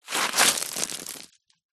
foley stones kick mound roll down

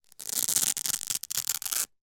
shin pads velcro rip; foley

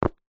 foley footstep single football boot on concrete

